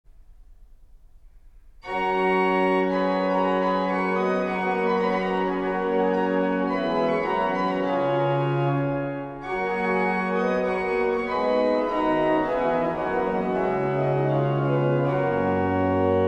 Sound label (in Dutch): improvisatie, kerk; oosthuizen